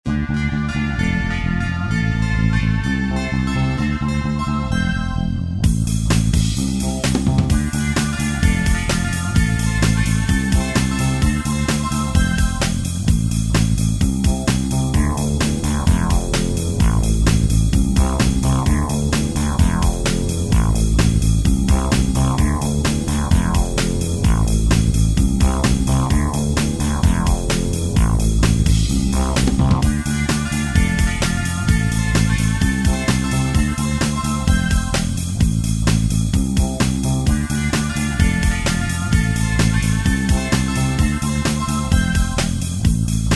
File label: Upbeat